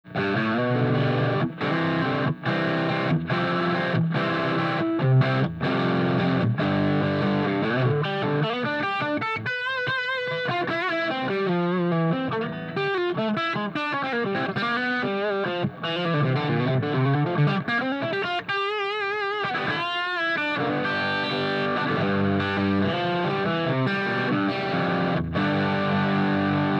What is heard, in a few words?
burnin tele